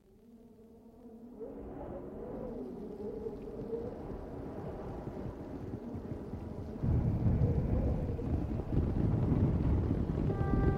Drums Of Doom

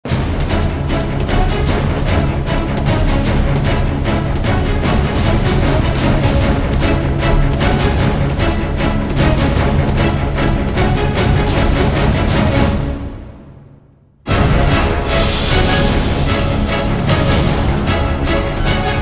No Choir, трейлерная музыка

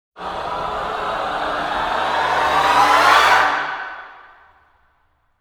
Sixty Voices Rise

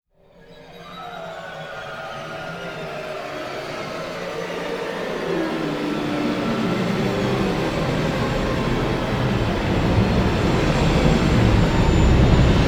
Orch & Choir Descent

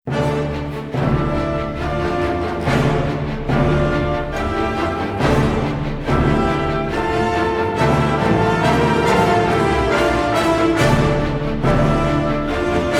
Orchestral Only; трейлерная музыка

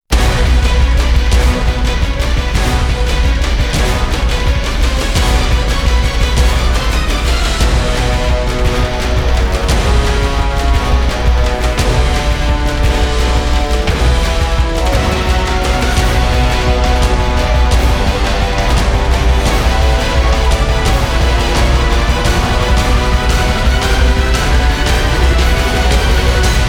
No Choir; трейлерная музыка

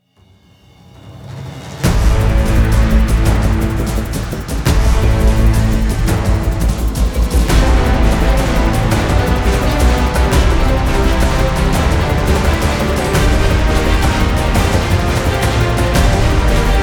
No Choir, Epic Choral Action; трейлерная музыка

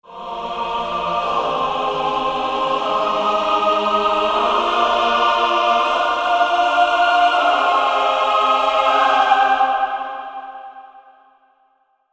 Choir; трейлерная музыка

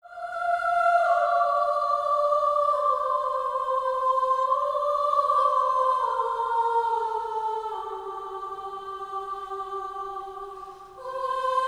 Solo Choir; трейлерная музыка

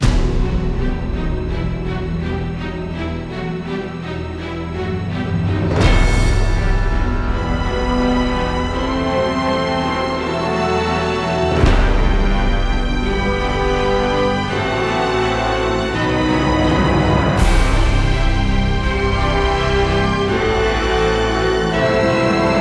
(No Choir); trailer music

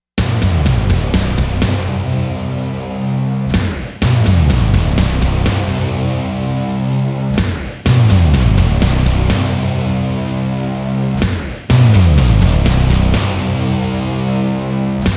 Snare up; Sound Design

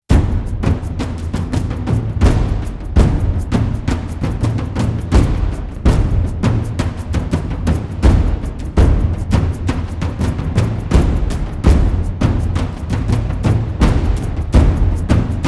Drums; трейлерная музыка

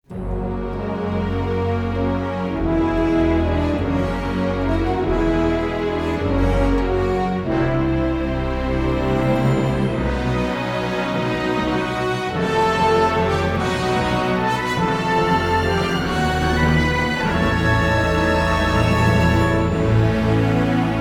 (No Choir), Orchestra

